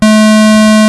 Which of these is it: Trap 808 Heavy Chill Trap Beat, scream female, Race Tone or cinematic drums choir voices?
Race Tone